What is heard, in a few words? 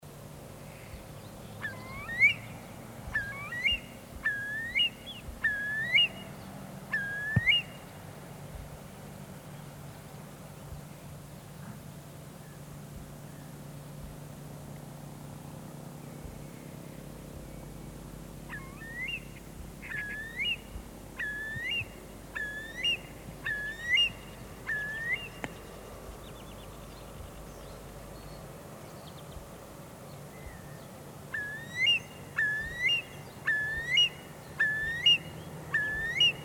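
Eurasian curlew voice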